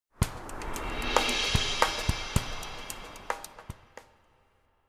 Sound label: Midwest w Perc; TWC Branding Music; TWC 2003; PM Edition